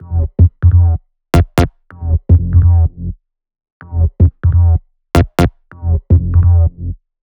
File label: 126BPM Thai Melody; Vengeance Samples